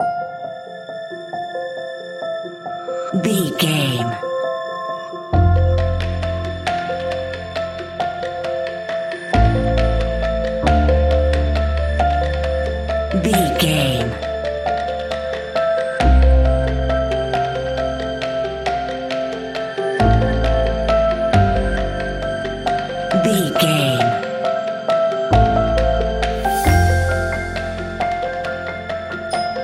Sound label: sentimental uplifting piano and strings narrative